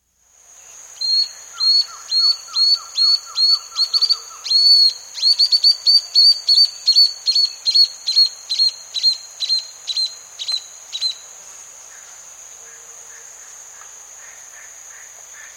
Pied Puffbird, S. America

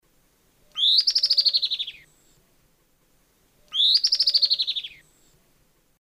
Myiopagis flavivertex, call; S. America; Eastern Lowlands

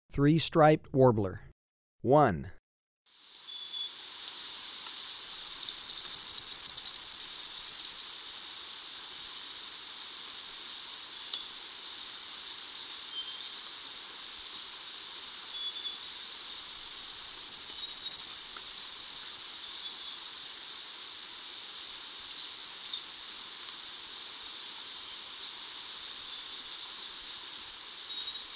Three-striped Warbler, Birds of Ecuador; S. America

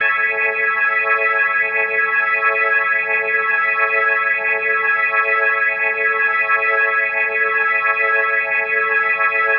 Medical Organ A Maj7 and 8va; Major 7; The Vitale Organ

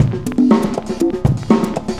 cooling 120, loops, 120bpm

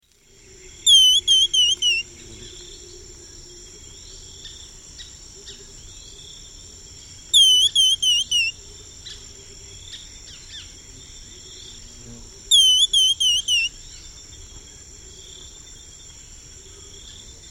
Cinnamon Becard; S. America

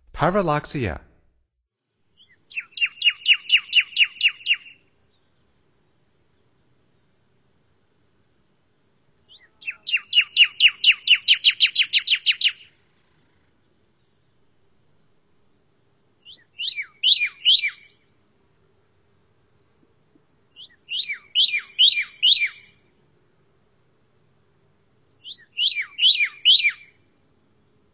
Pyrrhuloxia, Songs of Birds of North America; Stokes

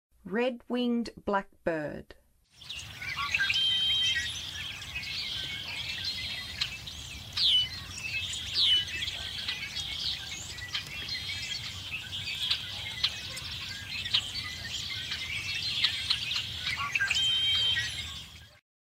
Red-winged Blackbird, Western USA Songbirds; North America

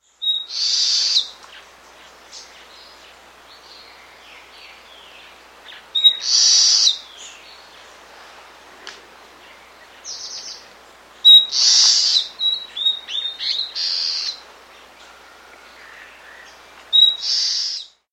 Ochre-Rumped Antbird, S. America

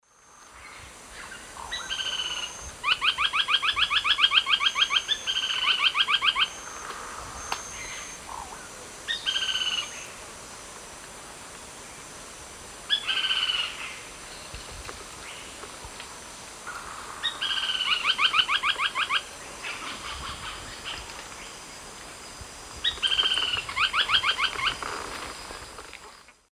Synallaxis albigularis, 2 song types; S. America; Eastern Lowlands

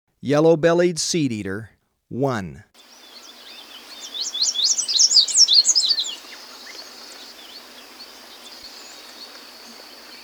Yellow-Bellied Seedeater, Birds of Ecuador; S. America

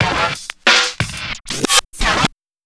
SCRATCH TACTICS

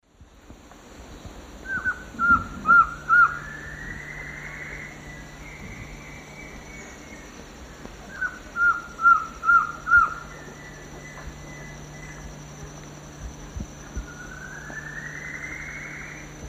Trogon collaris, song; S. America; Eastern Lowlands

